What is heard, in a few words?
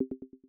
alert echo; furni